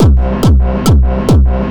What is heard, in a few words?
Reversed Bass